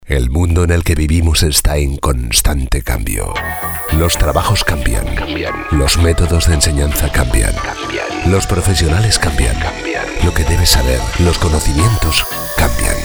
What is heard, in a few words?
Audio Mix Soundtrack Corporate Video; ENAE